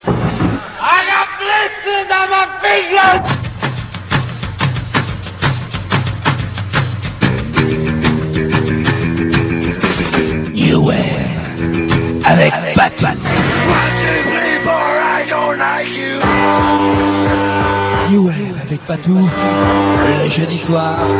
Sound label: jingle; patou